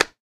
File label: boot slap; taunt; player; yeti standee; engineer; source engine; tf